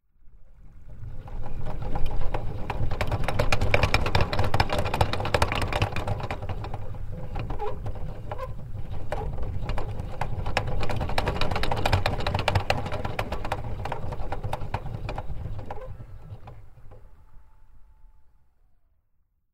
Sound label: small windmill